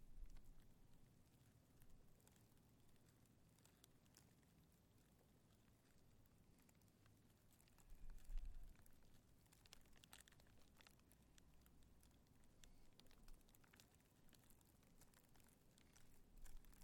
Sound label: plastic blowing in the wind